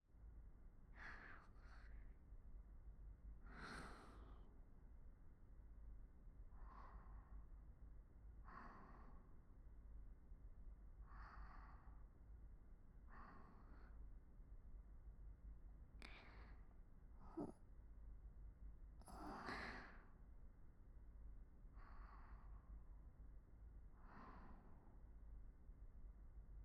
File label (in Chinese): 睡眠呼吸聲(左側); 致落入愛河的你。; 接受雛櫻日和的心意